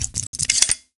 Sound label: elite reload start, weapons; Counter-Strike Global Offensive